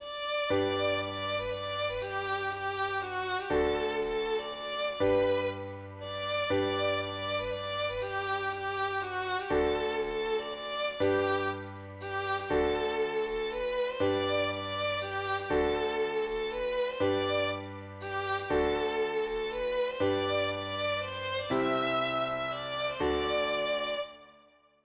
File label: mazurka